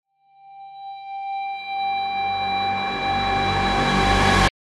end stinger end 72bpm

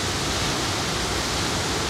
waterfall medium

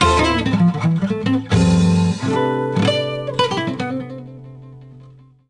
Jingle Best; tamagotchi connection corner shop ds; Mame

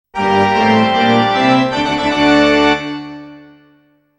STAGE CLEAR

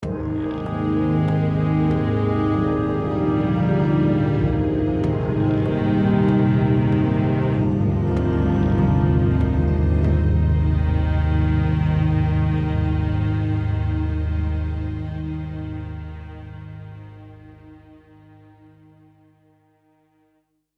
Hopeful

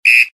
WHISTLE

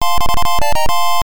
Level Complete; taito sj system arcade gamerip 1982; Jungle ~ Ship; Jungle King

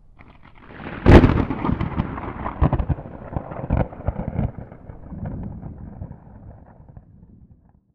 Lightning

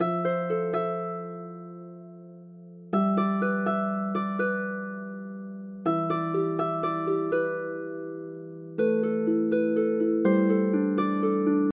City Guitar Busker; Xenoblade Chronicles 3

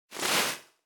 snake pain; kasket

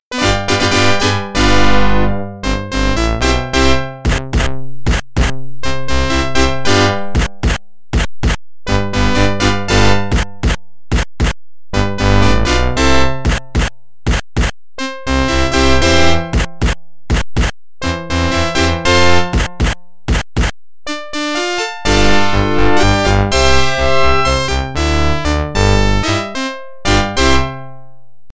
Organ

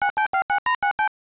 Phone; cobra mission panic in cobra city; ibm pc at

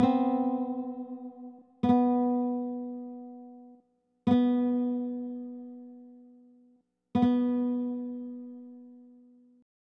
Playing Chords; betrayal at krondor

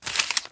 Object Collect

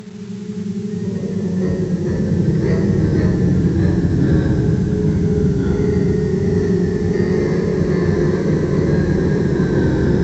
drone; Nancy Drew: Secrets Can Kill

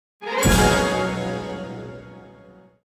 New Feature; super smash bros. ultimate expanded vol. 01 super smash bros.

